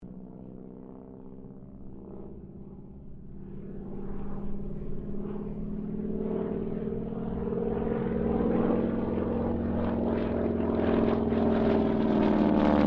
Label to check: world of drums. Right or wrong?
Wrong